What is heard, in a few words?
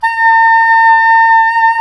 SAX SOP MF, Soprano Long